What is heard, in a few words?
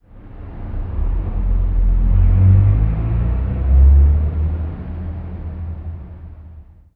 apc distant, streetwar; Half Life Model Pack; Half Life 2; ambient levels streetwar